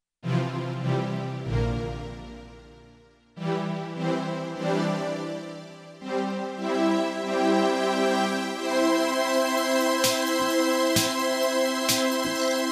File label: Percussivity